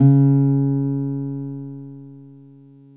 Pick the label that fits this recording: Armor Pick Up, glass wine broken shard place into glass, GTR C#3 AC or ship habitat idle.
GTR C#3 AC